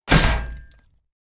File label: Metal Door Impact Hit Slam